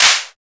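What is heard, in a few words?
CLAP; E-Lab; X Static Goldmine 1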